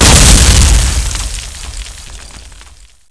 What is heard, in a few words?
explosion